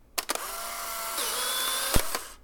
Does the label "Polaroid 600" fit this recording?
Yes